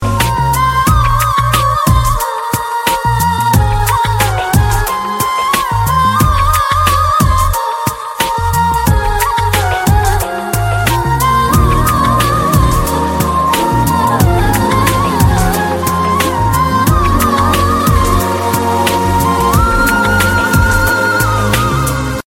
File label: new flute beat ringtone